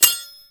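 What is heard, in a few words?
kar98 shell case; WAR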